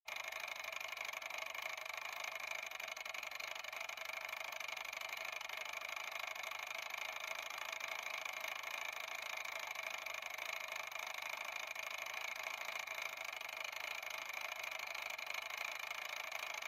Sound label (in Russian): mehanicheskij tajmer